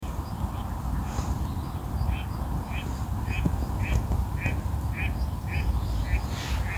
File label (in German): stockente